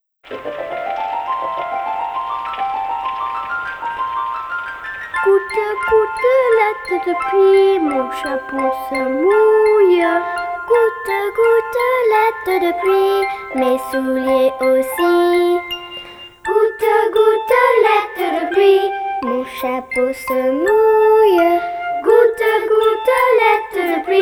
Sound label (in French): Goutte, goutelette de pluie